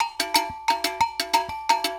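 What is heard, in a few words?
120 cowbell; Best Service Advanced Media Trax Modern Composer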